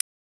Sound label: Burst